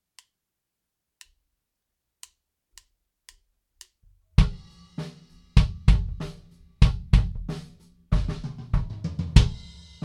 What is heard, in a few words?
No Effect Kick; Drum Recording Setup